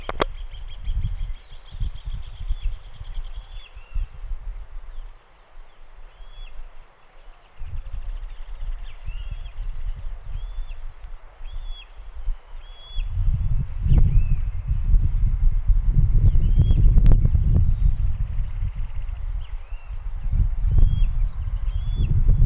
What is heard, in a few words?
Common Shelduck, Tadorna tadorna; Aves; iSoundNat; inaturalist